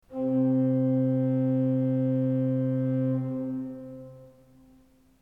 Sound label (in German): ton, orgel